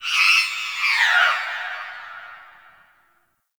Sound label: Sentient Lair Exterior Creature; Warframe; Ambience; Misc Creatures